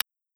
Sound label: tick, stopwatch